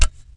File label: Ladder; Player; Footsteps